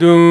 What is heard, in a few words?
VOX DOON E4, Scats Choir; 90s Sample CDs; Club-50 - Foundations Roland; Short Scats